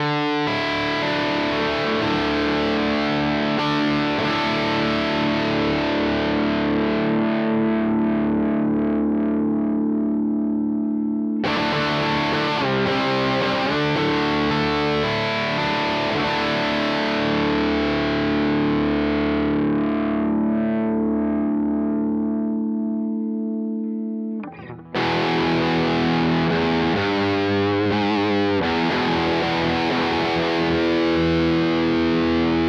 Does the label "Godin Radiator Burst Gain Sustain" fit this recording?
Yes